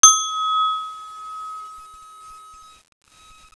chime

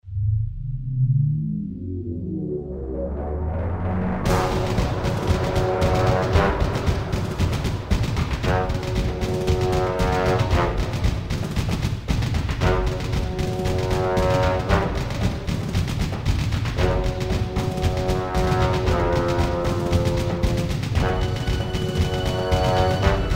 Setup Music

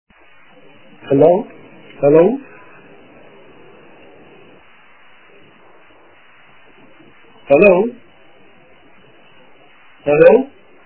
Yawn

